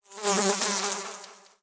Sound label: foxfire